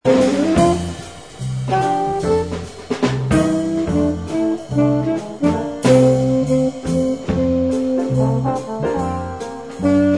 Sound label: live jazz quintet